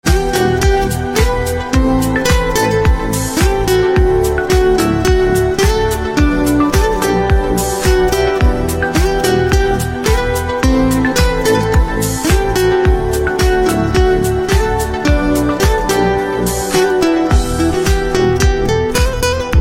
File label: Guitar